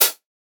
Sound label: Hihat; Antidote Advent; Infected Track Drums